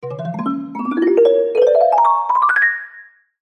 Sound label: cartoon ascend sneakily walk mallet orchestral; zapsplat